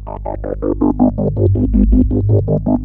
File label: 3100 G 2, Korg PS3100; Back In Time Records; Korg Universe VOL-1